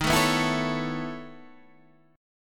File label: B 11th D sharp, chord